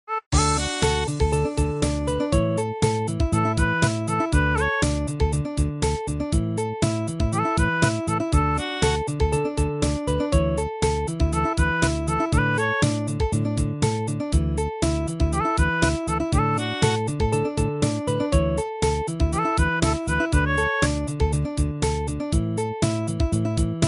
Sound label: nokia ringtone